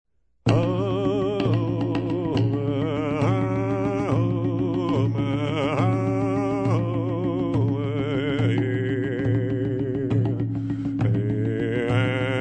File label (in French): Chant de pouvoir